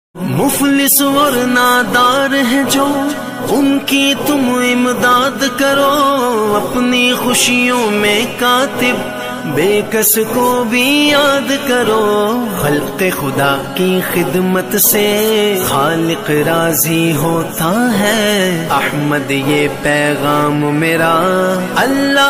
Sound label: Islamic Ringtone